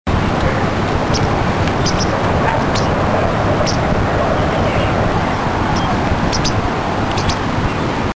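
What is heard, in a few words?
white wagtail voice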